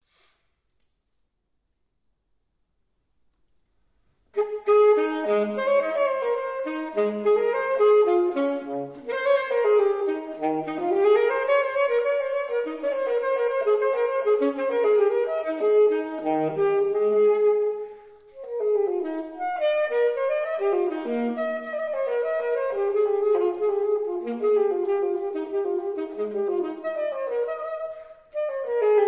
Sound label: Suite No. 1 for Violoncello Courante; Bach